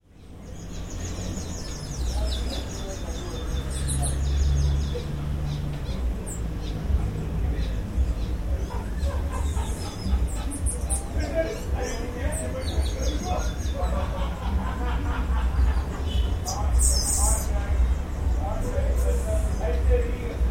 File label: afternoon sounds and other noises from barbados